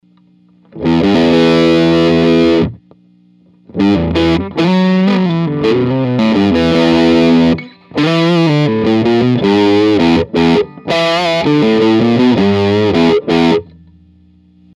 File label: fuz loose